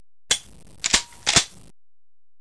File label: GUN